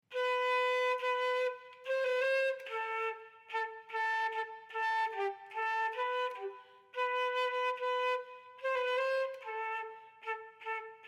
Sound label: instrumental